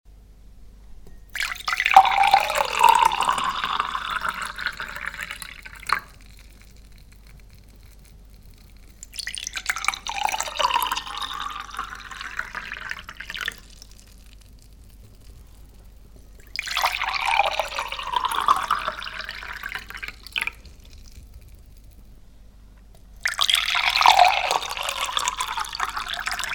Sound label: 4 glasses side